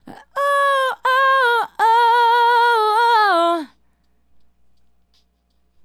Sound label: Female Solo, Hip-Hop and RnB Vocals